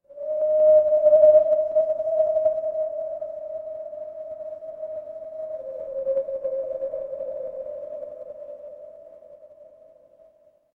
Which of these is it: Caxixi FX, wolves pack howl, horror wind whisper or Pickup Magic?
horror wind whisper